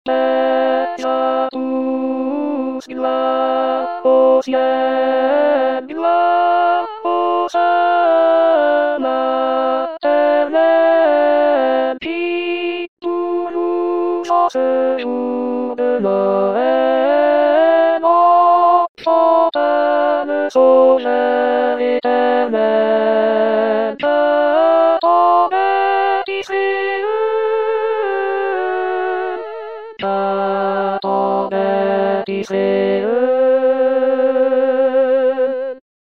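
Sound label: alto; Douce nuit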